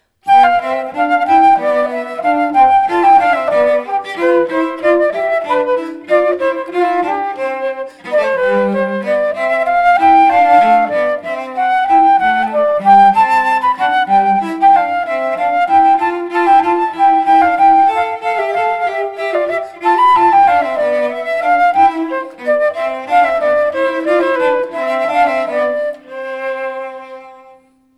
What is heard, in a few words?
cello flute baroque air